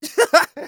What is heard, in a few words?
laugh short; Kurzes Lachen 2; Scout